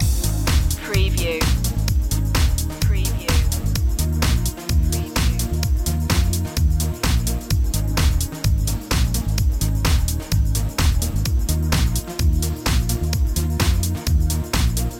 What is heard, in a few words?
up to this beat loop